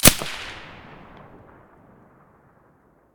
silencer dvl10 stereo

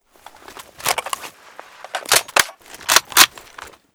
fn2000 reload empty, weapons

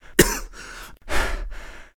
coughing; STALKER; IWP

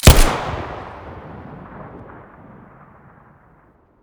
muzzle colt1911 stereo

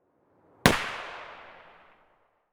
ak74 muzzle far, weapons; STALKER; IWP

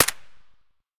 akm silencer distant, weapons; ak103; STALKER; IWP

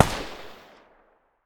muzzle p99 mono far, weapons

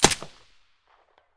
mp5 silencer mono, weapons

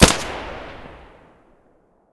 muzzle stereo, weapons; S.T.A.L.K.E.R.; IWP; fort

